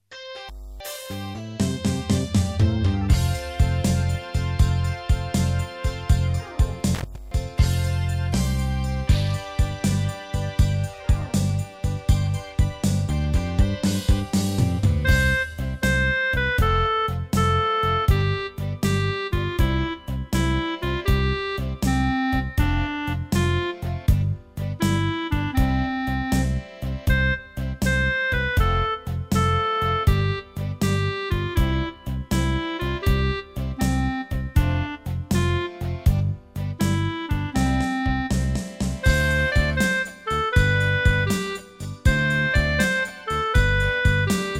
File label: Country Gardens Slow with melody